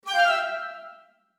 piccolo phrase

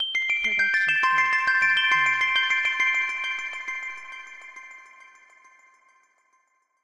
Happy Synth Ident; SoundsCrate

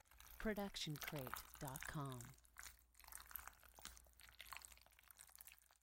squishy long; soundscrate

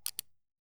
Cowboy Revolver Cocking; SoundsCrate

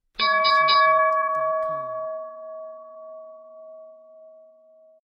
boxing bell

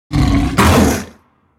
tiger roar; soundscrate

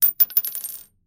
Gun Shell Drop; soundscrate

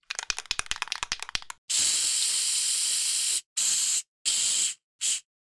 spray paint; soundscrate

